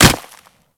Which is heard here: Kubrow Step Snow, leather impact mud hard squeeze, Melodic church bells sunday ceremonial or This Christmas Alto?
Kubrow Step Snow